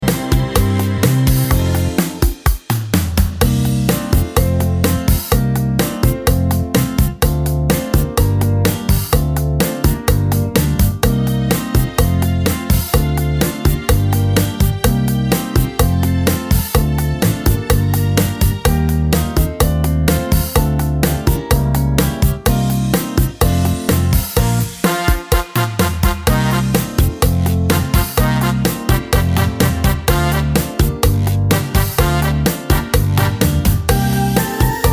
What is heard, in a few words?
cha cha